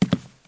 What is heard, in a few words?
footstep; slender